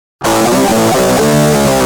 G Jam, Guitar; Industrial

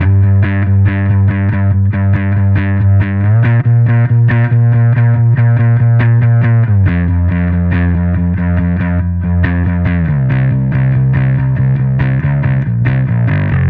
Bass

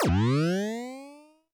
percussion, Roland TD-20

